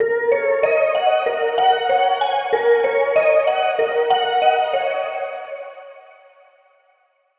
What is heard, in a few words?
Bell Arp Loop, 95BPM, G-m